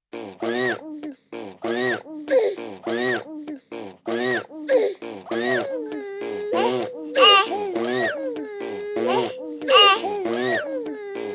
Baby, Baby, 1 yr., medley of baby sounds, laughing, crying, cooing, mouth effects